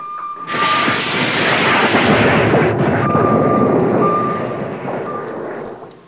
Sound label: torpedos, weapons